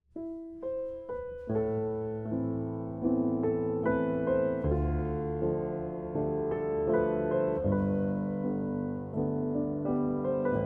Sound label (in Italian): La grande guerra Pianoforte